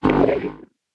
stomper idle, enemies; Factorio

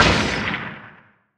asteroid damage oxide medium; Factorio

